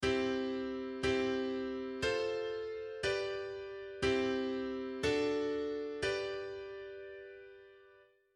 modulation from C to G

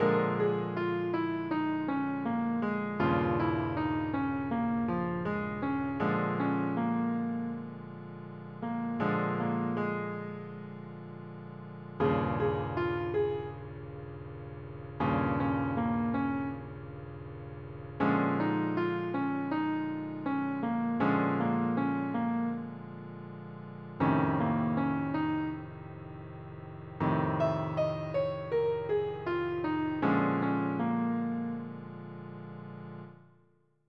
blues scale

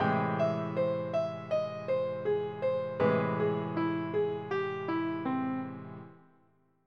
augmented ex; The Augmented Scale

